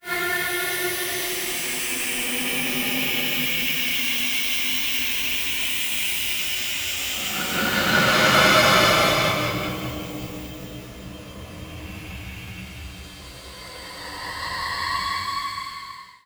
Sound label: Paulstretch; Siemens